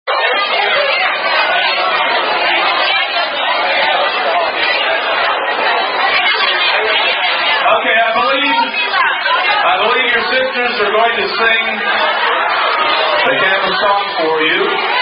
Kappa Kappa Gamma Songs, Sorority Songs; Several Kkg Sorority Songs

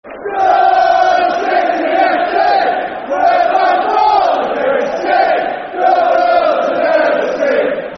Stoke City F.c., Football Chants; Stoke City Scfc

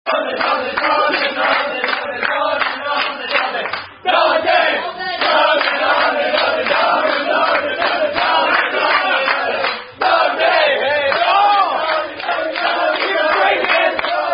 Down-Down Song; Nonde Nonde Nonde

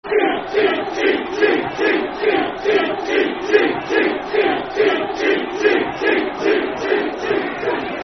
Cheat Football Chant (Soccer Song); Milton Keynes Dons Mkdfc

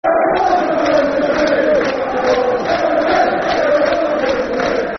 Fight Fight Chant, Football Chant (Soccer Song); Bristol City Bcfc

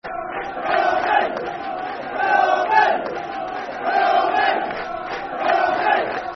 Red Army, Red Army football chant (soccer song); Brentford

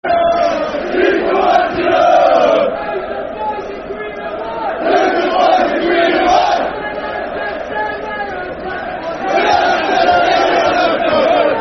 Everywhere We Go, Football Chant; Yeovil Town Ytfc; fanchants

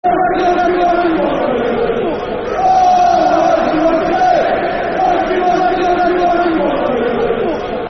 Football Chants (Soccer Songs); Wanky Wanderers; Blackburn Rovers Brfc

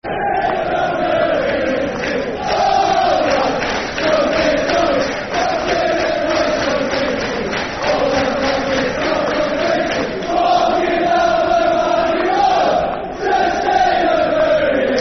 Football Chant; Bury; fanchants-com

